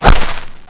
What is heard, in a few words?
DIRT HIT; RETURN FIRE